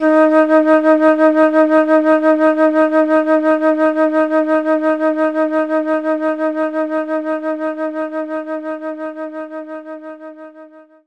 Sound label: Flute D#4; knights try